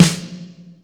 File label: RY30 SNR; Hollywood Edge; The Big Bad Beatmachine